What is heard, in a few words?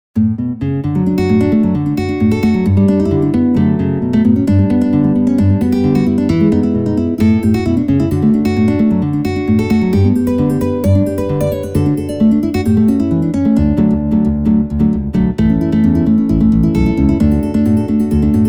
guitar Rag